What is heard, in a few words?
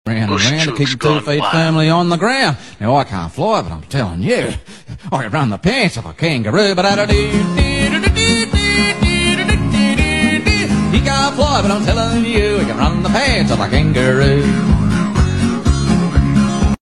country music; made with Voicemod